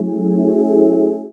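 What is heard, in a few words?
Pad Synth; Equipped Music; Loungin House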